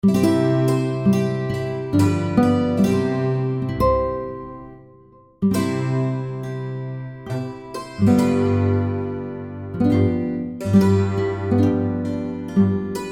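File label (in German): Jodler, Flötentöne; 29 - Jodler 2 (Playalong); Jede Menge Floetentoene 1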